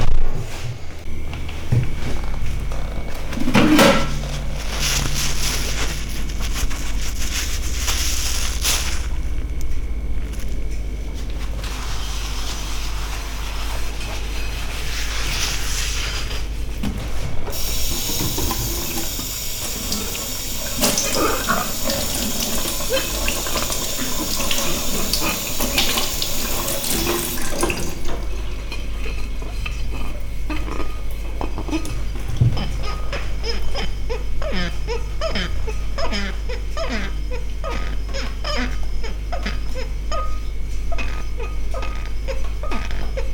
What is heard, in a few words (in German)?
Spuelsinfonie, Fieldrecordings, Cafe in Lissabon